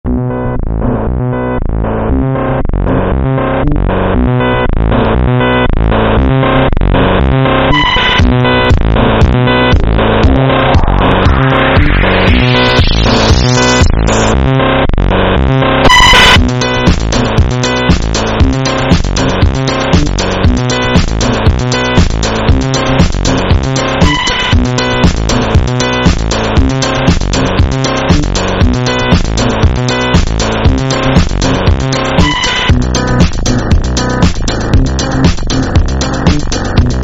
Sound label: phonk; salinewin exe virus